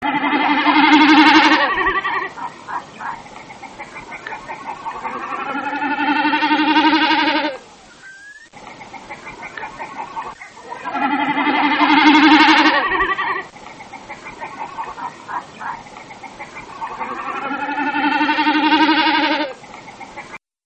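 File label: bird snipe woodcock; animals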